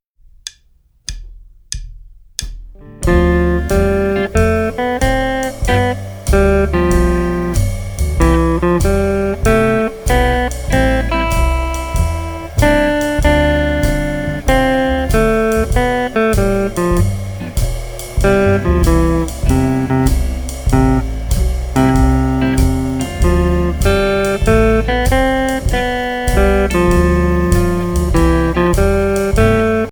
C Major Scale Etude, guitar

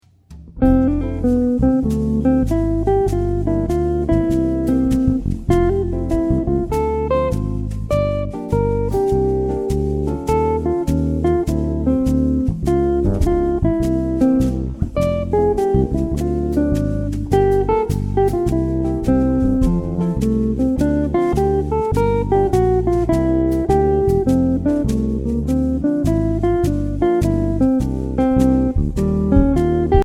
jazz guitar

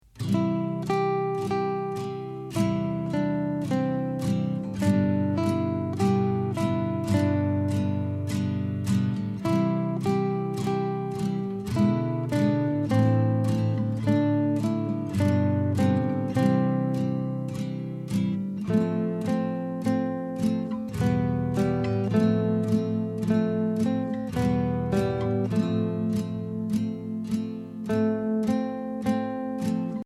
Largo, General Guitar

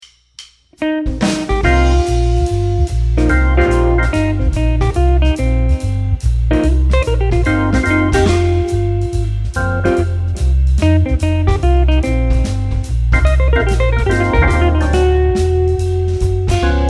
C Minor Blues Solo, Jazz Guitar

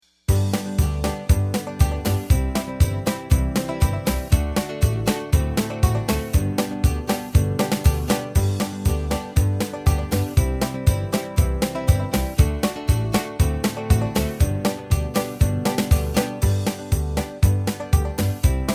Bluegrass Chords; guitar chords scales tuning

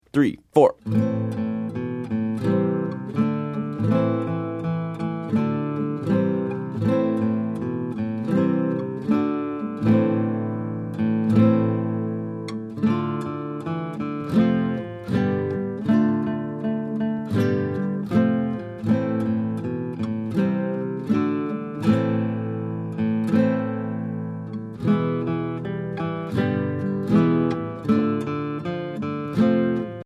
Boogie Style, guitar

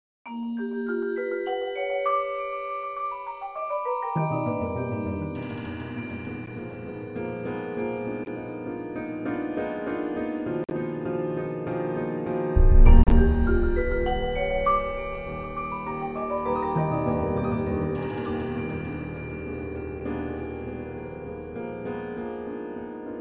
black rainbow piano; C Alan Publications

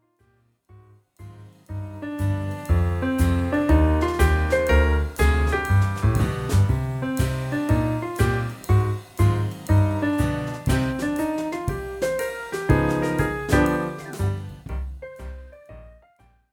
East Side Stride - Performance Mode, piano; alfred premier piano course

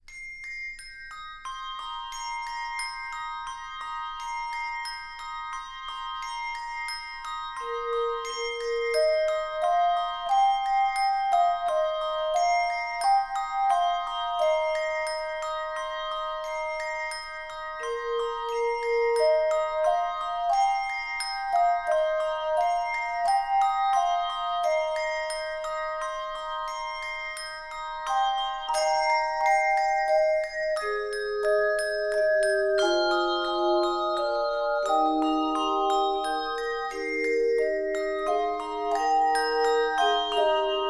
Handbells 3-5 Octave; Lorenz Corporation

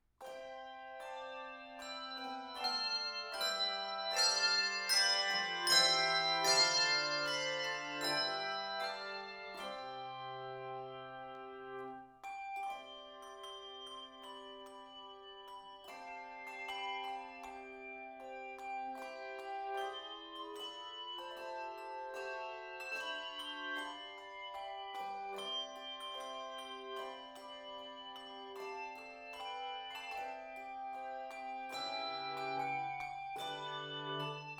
Handbells 3-5 Octave; Lorenz Corporation

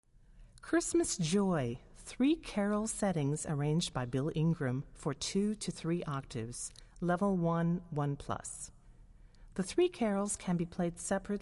Handbells 3-6 Octave; Lorenz Corporation

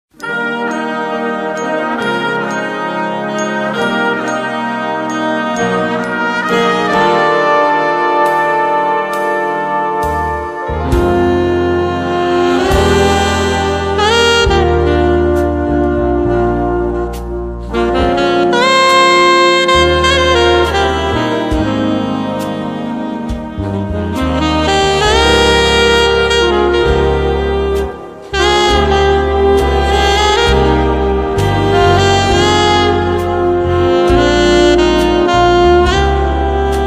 Alto Sax

